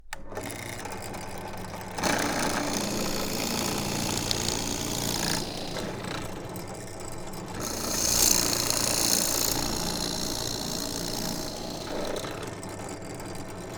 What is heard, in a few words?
drill drilling woodblock mono